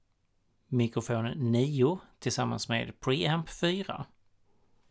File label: mic pre